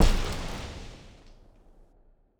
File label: Explosion Blast with Debris; SoundsCrate